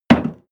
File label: impact dull metal damped; soundpool